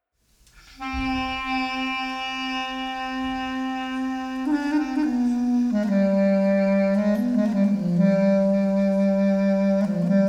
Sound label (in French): Improvisation à la clarinette